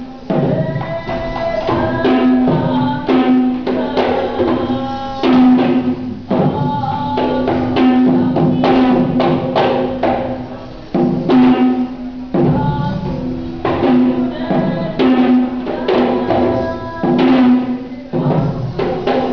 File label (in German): poya tanz